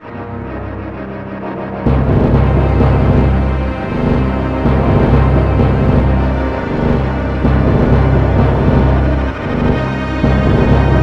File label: bomb drop